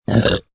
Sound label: Burp Wet, Burp, low, wet (human voice); Burping Sound Effects